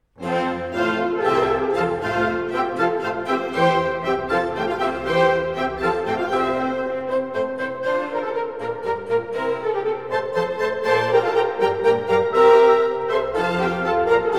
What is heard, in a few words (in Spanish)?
Musica acuatica de Haendel, la orquesta barroca